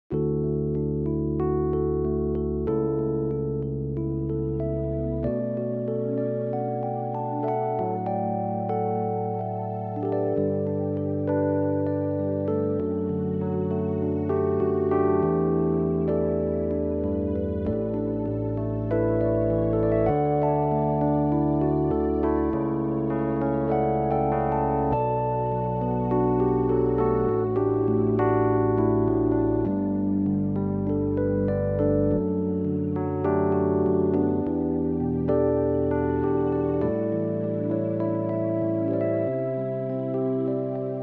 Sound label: rhodes synth